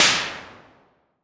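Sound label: noise cymbal, Kawai XD-5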